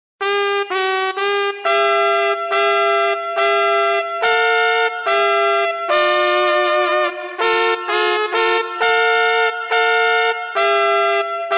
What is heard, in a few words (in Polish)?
trąbka